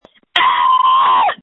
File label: screams